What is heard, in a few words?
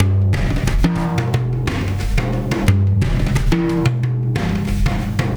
Tactile Impulse, Processed Drumkits